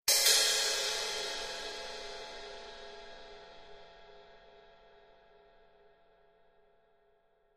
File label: Cymbal Accent Hit, drums cymbal